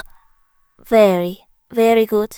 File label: Elevator Start; Level3; Experimental Room